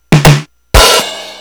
rimshot

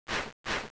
scaird blow

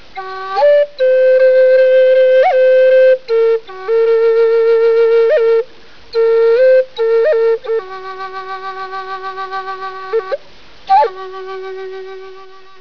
indian tune

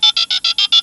FAX

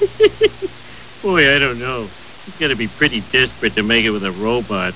robot

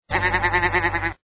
sheep

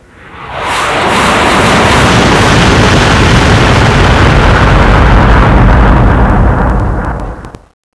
Bomb many

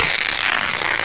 rip

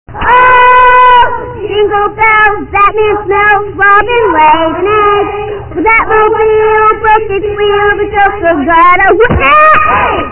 jingle bells

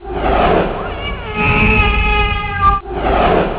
scaird cat

